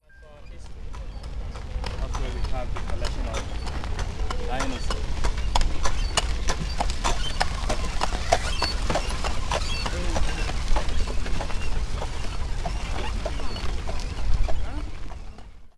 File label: Carriage On Gravel; SoundsofPhila